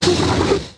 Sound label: stomper death big, enemies; Factorio